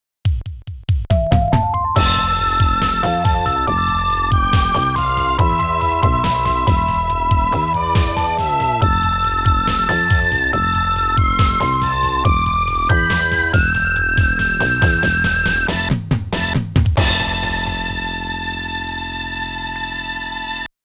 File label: Ending - Sad; Sonic Wings; Video System Arcade Sound Digital Collection Vol.1